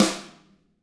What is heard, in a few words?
TAMA rim